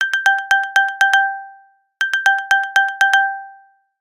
find my iphone sound

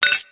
new message notification; mlbb

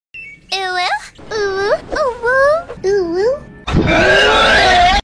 uwu blaaaaaaaaaaaaaaaaaaaaaaaaaaaa

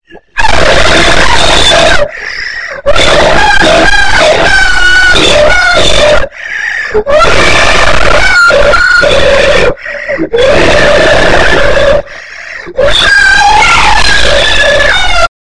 scream mega bass boost

